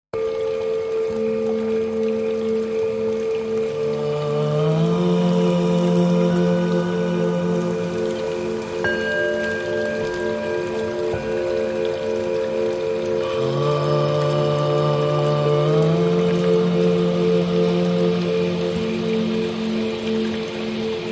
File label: peace bells